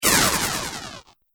Science Fiction Laser Plasma Bolt, laser gun

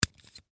Needle Handle, Needle Handle Unlatch Fast; Surgeon Surgery Four Inch Disposable Bone Marrow Biopsy Aspiration; Hospital; Emergency

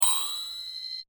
sound design strange ring; Sound Design - Hi - Tech Strange Ring Hit; Strange Tones

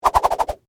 Whip Whoosh Multi, Throw; Foley; Whip Sound Effects; Whips (Sound Effects:Objects)

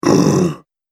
Orc Grunts, Monster; Horror

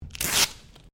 Sticky Tape Rip; common tape; Offices

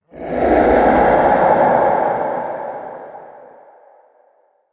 Pyramid Phantom, ghost sound effects; Andrew Fleming

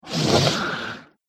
Troll Snotty Growl; Monster Sound Effects; horror

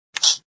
Electronic sound from handheld device; Beeps (Sound Effects:Multimedia)

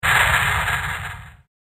Slow Harsh Whoosh

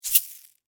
Shaker Tube Shake, Single; Household; Shaking (Sound Effects:Objects); BlastWave

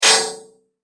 multimedia rollover, Multimedia Rollover Tone

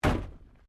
WRECK PANEL CRUNCH LIGHT, Wrecked Cars; impacts, crashes, scrapes; Objects

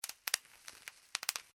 packaging bubble wrap pops; foley; Bubble Wrap Packaging Being Popped; Plastic; Materials

